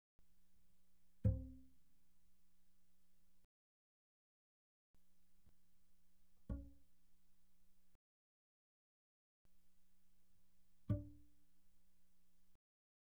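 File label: Bass pizzicato pianissimo sul G C4 G4, doublebass; Strings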